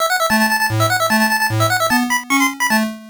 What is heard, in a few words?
Game Start; Champion Boxing; SG-1000